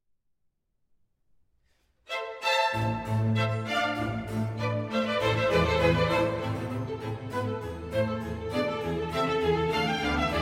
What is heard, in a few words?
Violin Concerto in A Minor; No. 1; BWV 1041; J.S. Bach